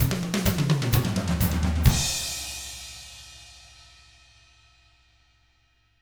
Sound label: 127 Drums